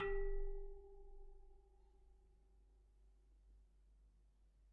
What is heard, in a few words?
Bicycle Spoke